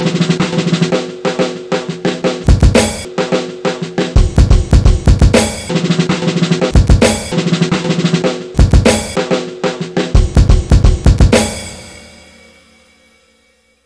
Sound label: drummer